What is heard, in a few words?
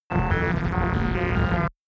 space computing astronaut